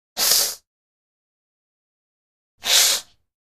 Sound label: Hisses generic High, monsters